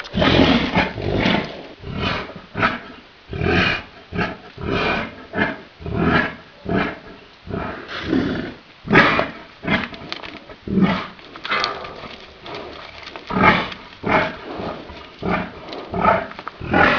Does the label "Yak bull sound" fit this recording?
Yes